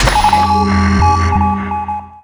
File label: scanner; garrysmod